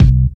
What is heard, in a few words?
modern nice steel kick drum sample c key, kick drum sample tuned to the C note